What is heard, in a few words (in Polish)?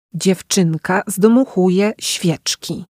dziewczynka zdmuchuje swieczki